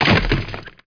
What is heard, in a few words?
sound trooper body; MOTS